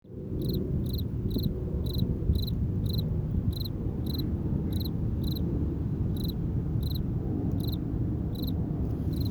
highway cricket; crickets

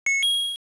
Get Rupee; LOZ

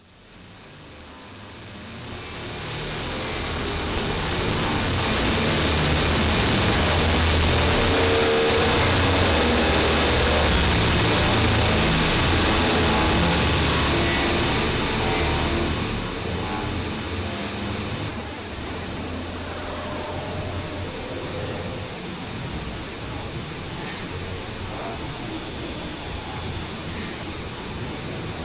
grain merged; morphology

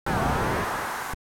Boss Scream; LOZ

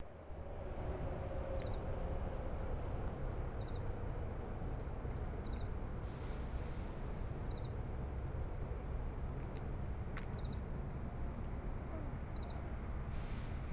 soulful cricket; crickets

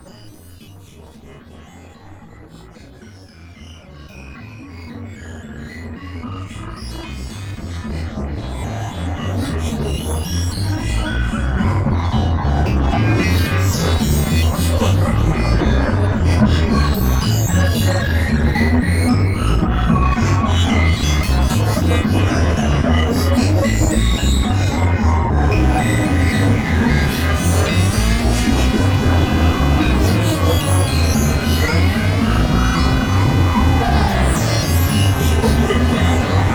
blip, synth; spacepop

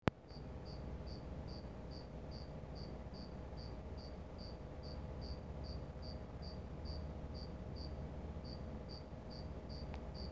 charming cricket; crickets

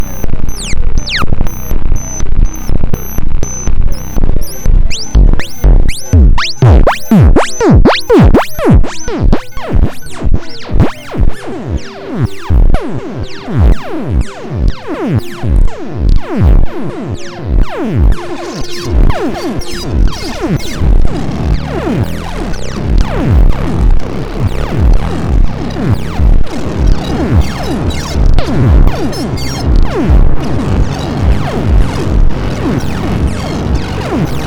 stridulations; songs of insects

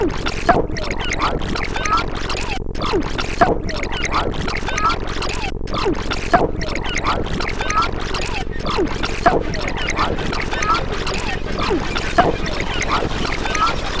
splashy, synth; songs of insects

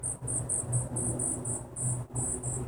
sparrow on sycamore

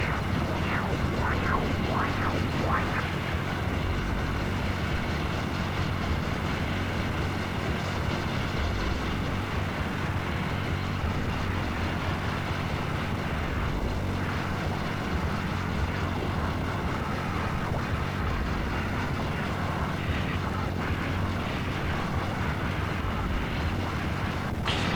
scarab, synth; songs of insects

